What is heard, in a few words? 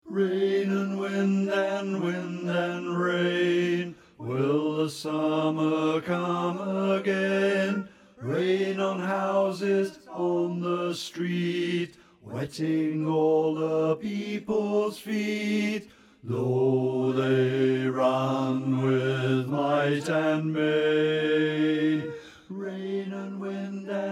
Bass